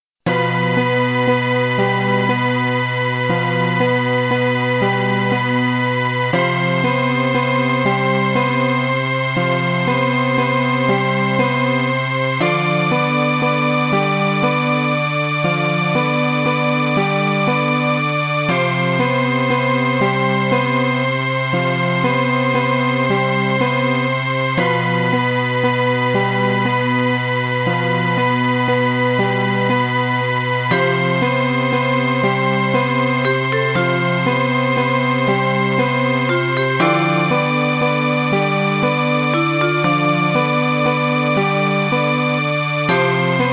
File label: game eerie